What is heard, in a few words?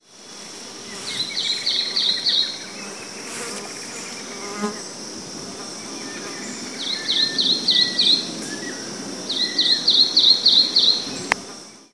Hylophilus poicilotis; PE Cantareira